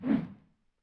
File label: Imp Attack Whoosh, imp fireball; DoomRTX